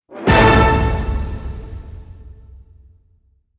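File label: Hit Orchestral Glam A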